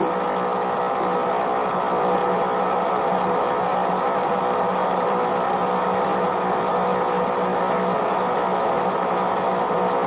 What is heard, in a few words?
Hum